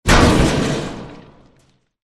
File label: Ship Shield